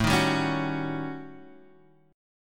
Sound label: Eb 7th Sharp 9th Flat 5th, A